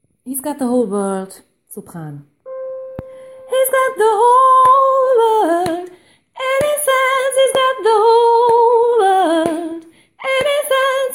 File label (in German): sopran; He's got the whole world